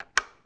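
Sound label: switch button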